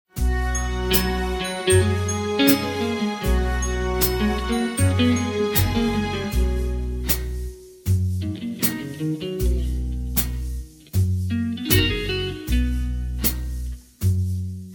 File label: (B) No Backing Vocals